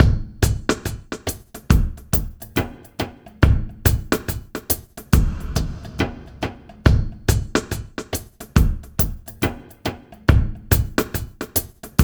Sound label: Electronic, Drums & Percussion; Sonic Foundry (Sony Creative Software) - Electronic Point Blank